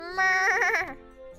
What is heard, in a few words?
muuuuu